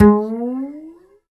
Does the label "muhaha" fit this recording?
No